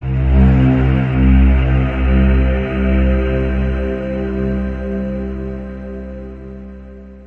night; DoomsdayRescue

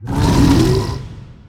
Boss Attack; ZombieBattle; audio zombie

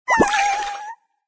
emotion tears; wanNingTaiQiu

